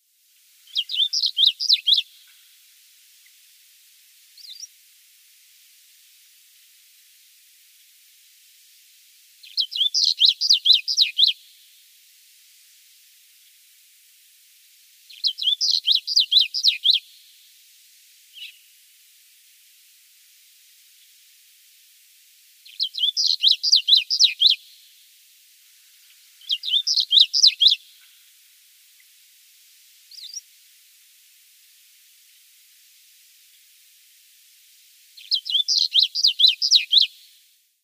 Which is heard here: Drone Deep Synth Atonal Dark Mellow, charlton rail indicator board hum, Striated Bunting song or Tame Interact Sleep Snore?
Striated Bunting song